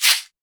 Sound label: hats oneshot papercut, Hat; Pop; Drums; Slate; SD PASADENA Pop Sample Pack